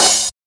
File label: dark tone open hi hat sample f key